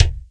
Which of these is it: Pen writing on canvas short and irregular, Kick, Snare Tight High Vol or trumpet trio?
Kick